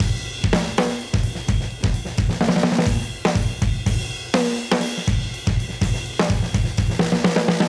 Modern Breaks; Touch Loops; ClassicBreakSessions

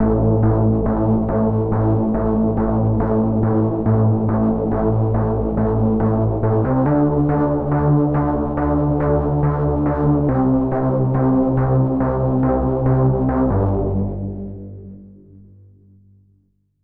HARD BASS 140 A, Hard Trance, Bass

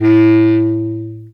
Bass Clarinet A1; VarazdinOrchestral

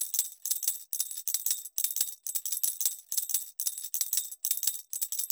ETHNO EXTRA 90; ethno2

